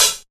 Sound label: tamed hi hat sound B key